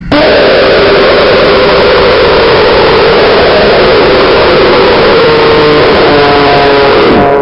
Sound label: jumpscare sound; the return to flumptys